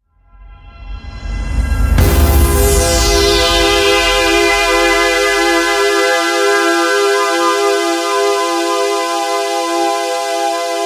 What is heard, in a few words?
Music One Shot